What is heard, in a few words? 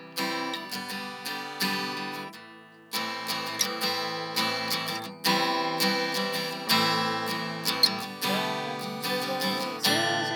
Rhythm